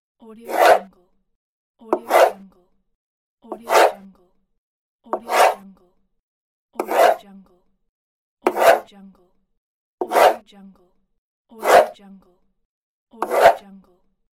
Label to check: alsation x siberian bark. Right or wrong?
Wrong